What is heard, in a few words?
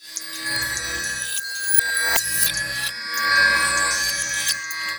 loop, techno atmos